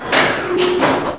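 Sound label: elevator stop; XDM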